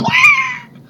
Rat death

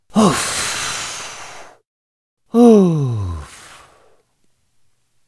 Male Uff Voices

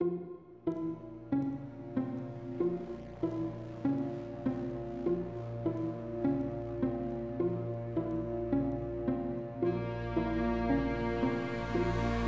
Dramatic

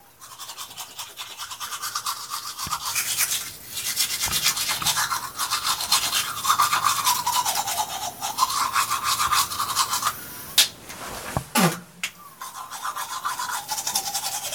Brushing teeth

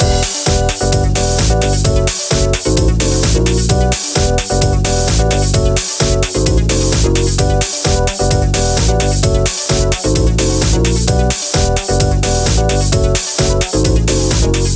Pop Dance Loop

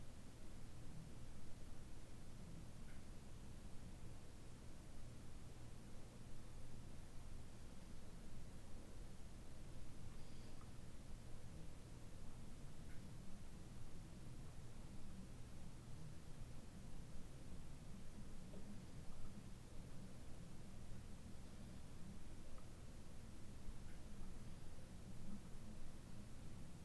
Roomtone Bathroom No Vent

